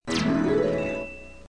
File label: begin tune; Dragon's Lair